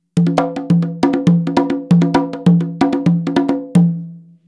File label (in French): frise, congas